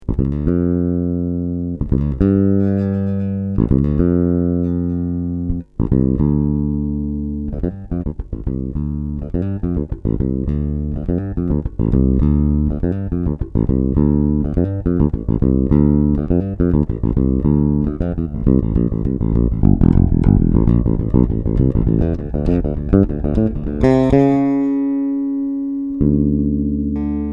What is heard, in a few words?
mids